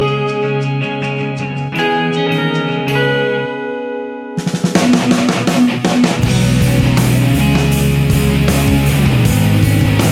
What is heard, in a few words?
awesome guitar reef, rock; ringtones